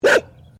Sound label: Animal Dog Blue Healer Bark